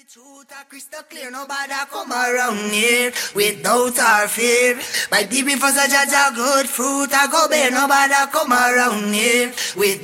Rivers Of Water (Acapella); Biblical

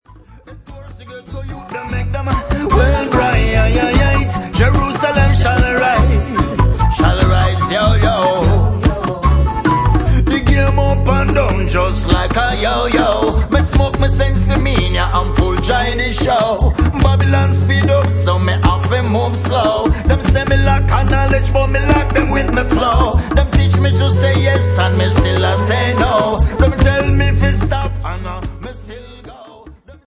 Acoustic

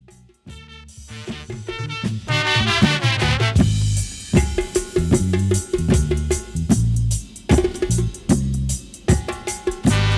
Burning Drums

